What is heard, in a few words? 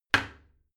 toilet seat close regular; haus-garten